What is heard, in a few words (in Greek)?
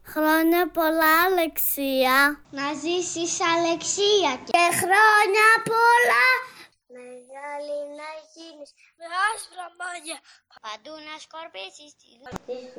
τραγούδι